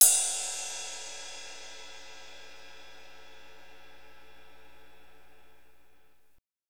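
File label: cymbal ride, fast rock, kit dry, Roland; Northstar; Drumscapes Roland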